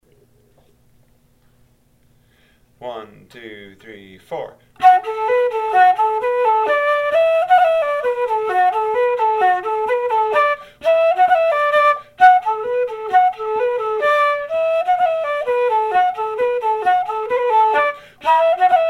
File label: Kerry Polka fast